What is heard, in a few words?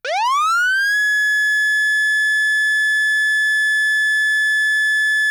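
Federal Signal Touchmaster Delta Yelp, siren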